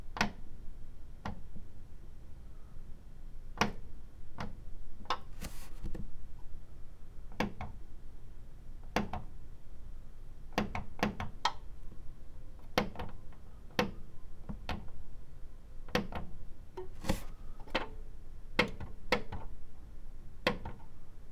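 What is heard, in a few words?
Tv Switch Sharp Plastic; Poetry Project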